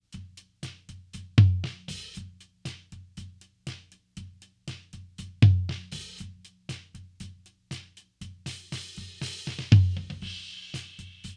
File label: Rack Tom